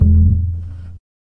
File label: Upright Bass Notes